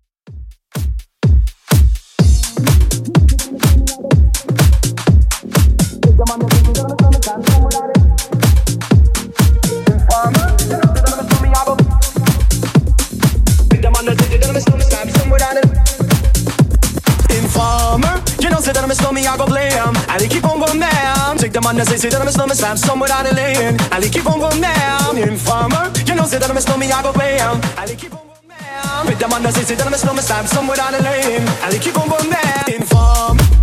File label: House Redrum 125bpm